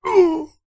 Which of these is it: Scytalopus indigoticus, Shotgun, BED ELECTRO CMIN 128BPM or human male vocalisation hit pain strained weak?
human male vocalisation hit pain strained weak